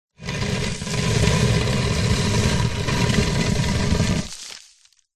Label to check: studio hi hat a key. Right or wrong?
Wrong